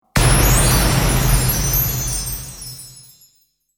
Magic Explosion, Magical poof explosion for many uses.; Explosions